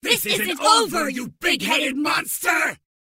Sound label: MobVoice Mad Mage Die